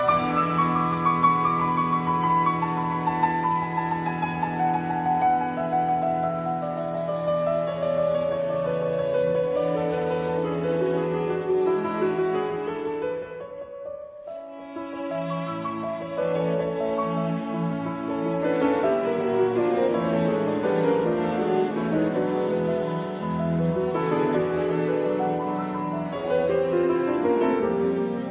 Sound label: Quintett A dur; Franz Schmidt